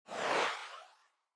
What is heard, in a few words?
Swoosh, Alert Tones; iOS Tones; Classic